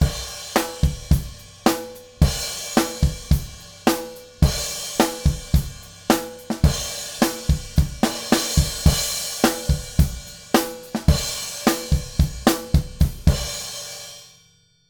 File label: Acoustic Drums - Acoustic Drums Pad; CRTIV Shumovick